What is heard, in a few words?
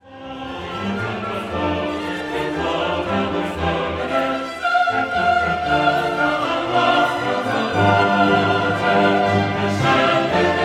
Credo Qui propter